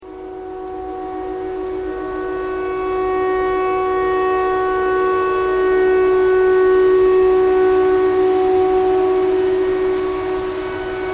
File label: tornado siren tornado siren thread simple